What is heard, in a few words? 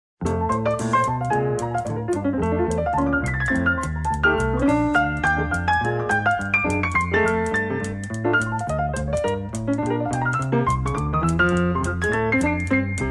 Dixieland Jazz